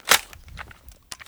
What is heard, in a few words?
m4a1 clip in; garrysmod; m4a1 customizable